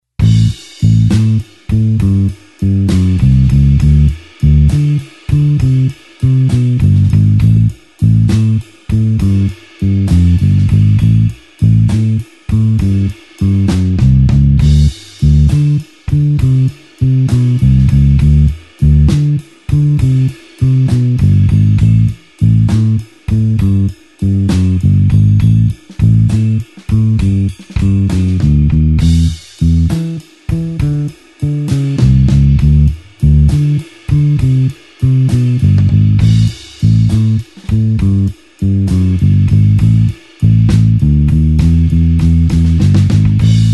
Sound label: blues bassline slow A